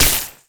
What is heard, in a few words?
lightning impact